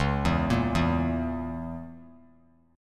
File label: C sharp Minor 6th; C#m6; arpeggiated; JGuitar